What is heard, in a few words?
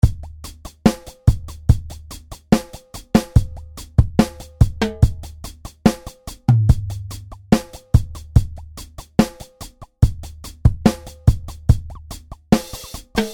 dub kit